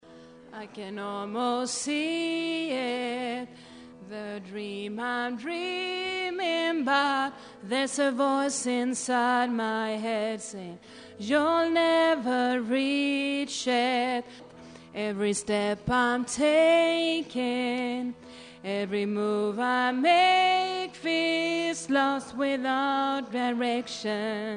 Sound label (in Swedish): bas, stammor